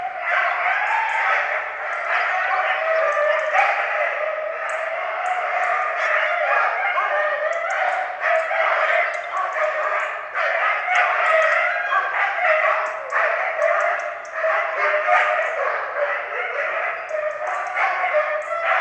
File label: domestic dog canis familiaris; zvuki laya sobak